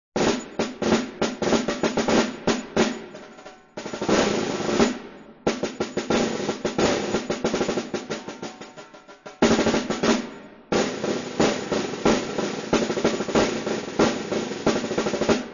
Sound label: Crossover Military